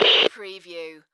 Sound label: CB Radio Static Short